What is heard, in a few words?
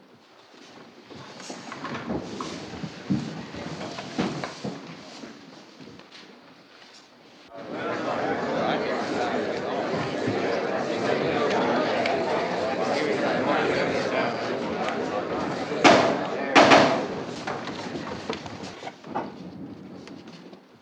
Courtroom Pre Trial Murmuring